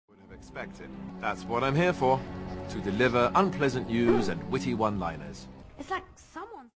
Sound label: message tone